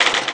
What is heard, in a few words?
typewriter; matilda